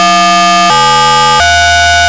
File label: tone code